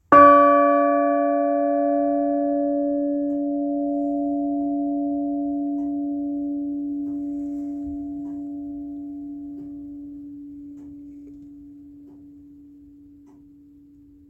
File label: bells of sussex; balcombe